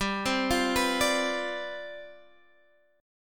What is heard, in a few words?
C Major 7th Suspended 2nd Suspended 4th G, chord